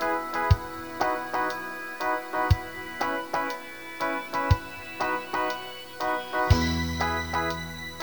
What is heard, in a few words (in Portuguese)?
trilha; GEOGRAFIA